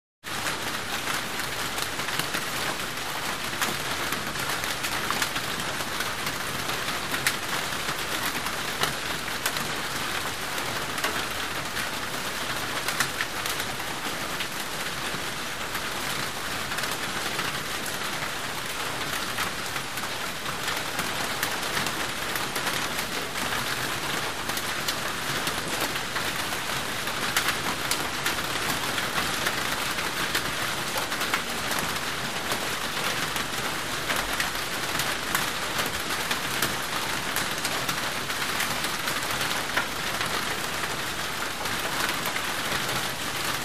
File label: Medium Heavy Tropical Rainforest Rain On Sheet Metal Roof - Int Pov